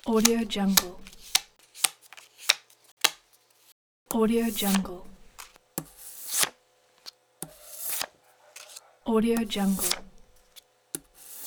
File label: playing cards pack